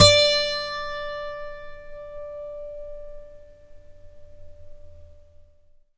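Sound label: GTR D2 12; Synclavier Sampler Library; KEYS AND GUITARS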